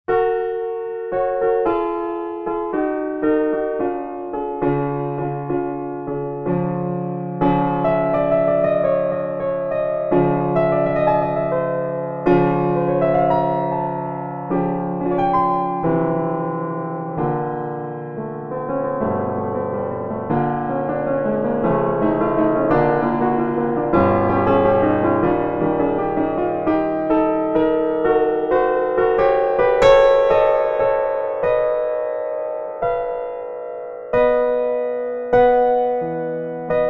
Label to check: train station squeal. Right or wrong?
Wrong